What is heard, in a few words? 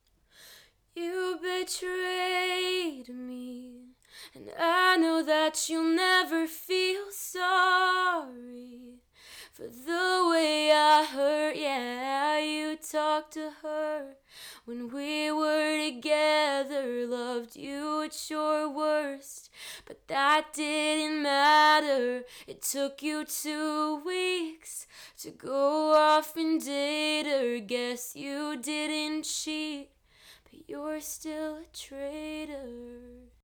cardioid sing